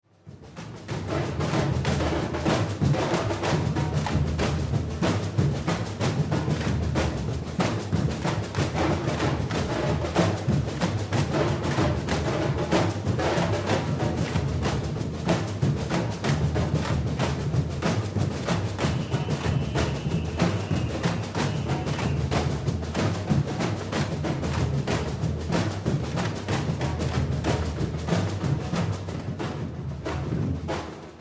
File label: samba reggae